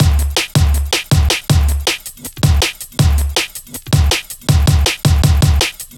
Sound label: Swing, drum loop, jungle, 160.5; Zero-G Jungle Warfare 1, 2 & 3